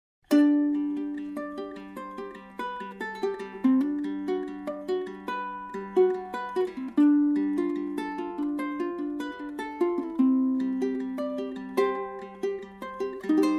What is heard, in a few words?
uke concert